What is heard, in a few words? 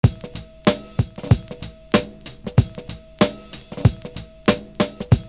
PICK DA SNR, Kitch 70s; Univers Sons